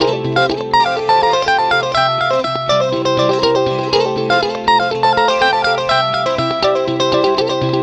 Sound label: guitar, stringed instruments